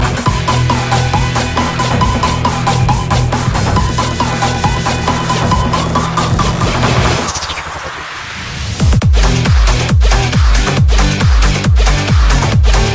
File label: Drum